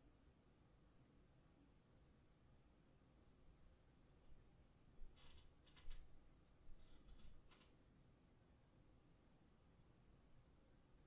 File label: Static 2nd Floor Bedroom